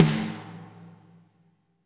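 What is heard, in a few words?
reverb snare left; Spectrasonics; Burning Grooves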